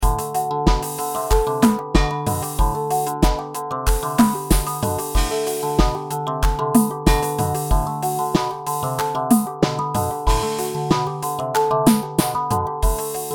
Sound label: waveguide guitar